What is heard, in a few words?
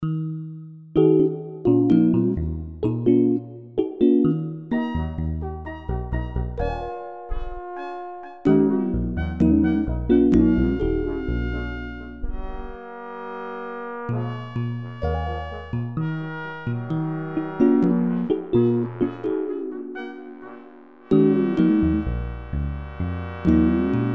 drum